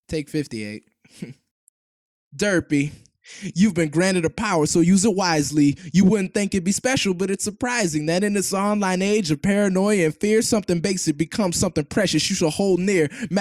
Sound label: vocals only 97.5BPM; Acapellas